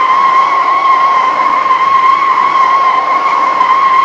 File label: skid road dry, Vehicle; NASCAR Thunder 2004